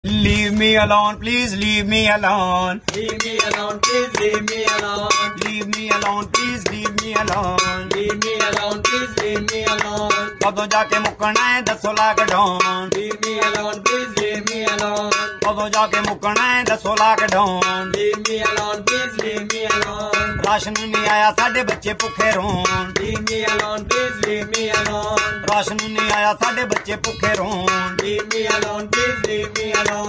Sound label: punjabi song